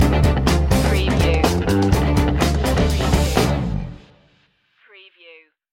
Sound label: b-boy hustle stinger